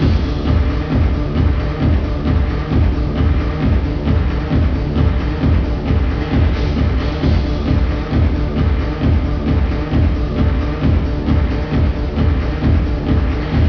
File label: song